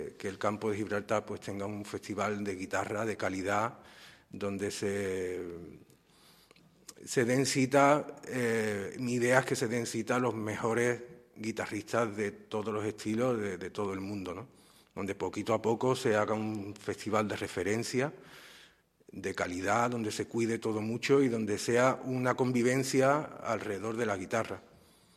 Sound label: Guitar; Almoraima Guitar Fest